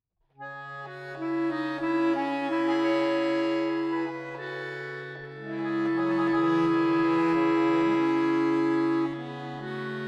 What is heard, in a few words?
Prelude in C sharp minor